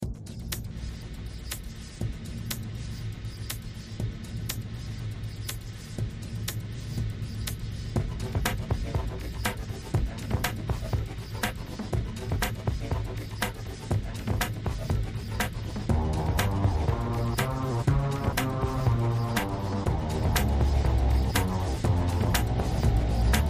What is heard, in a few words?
electric music and sounds